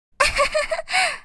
Cute giggle laughing anime girl vocalization

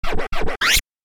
DJ vinyl scratch

